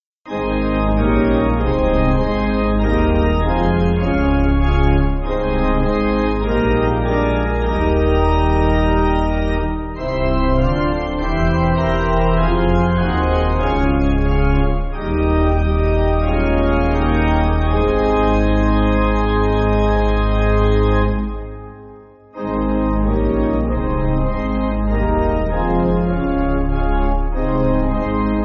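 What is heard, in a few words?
Pipe Organ, Music Backing; Himnario Bautista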